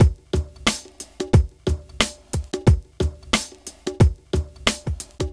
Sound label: Drum Loop Before; Slam Pro